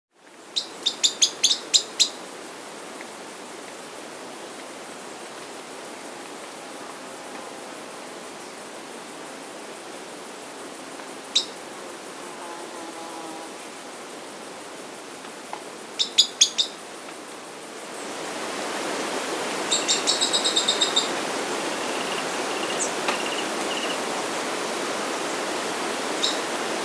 Mottle-Cheeked Tyrannulet (Phylloscartes Ventralis), Andean Birds; S. America